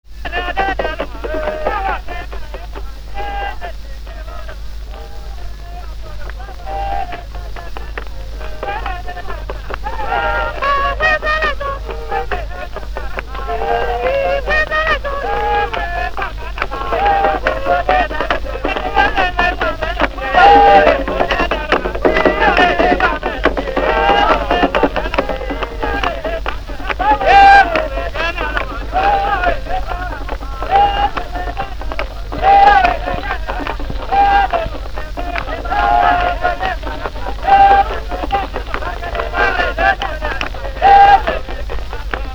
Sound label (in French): Chant du Travail, French Guinean group; Excavated Shellac; Unknown French Guinean group